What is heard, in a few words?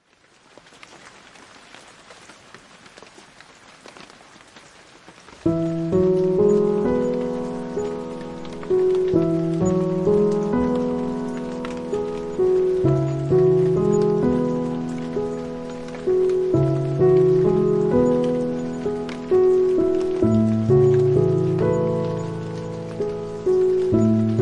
Paradise Rain, Piano in Nature